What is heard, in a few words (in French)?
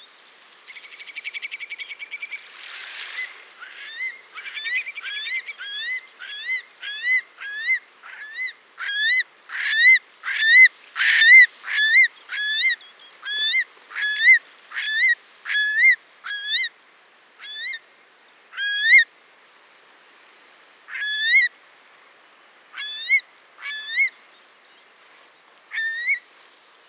Courlis cendre Numenius arquata